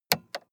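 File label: sports car interior switch on release; verkehr transport; autos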